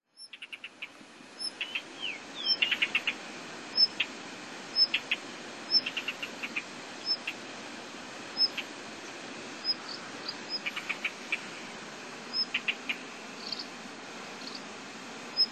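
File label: isohiyo, Blue Rock Thrush; nara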